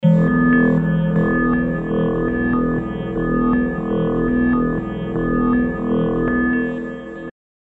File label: massive didgeridoo